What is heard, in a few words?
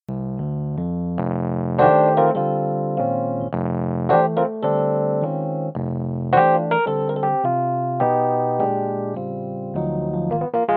stagepianos roland rhodes